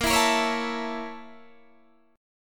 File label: A sharp Minor Major 7th Flat 5th, down, Guitar, Standard; strummed; JGuitar